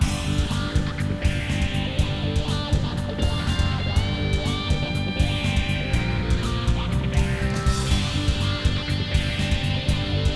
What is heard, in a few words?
ROCK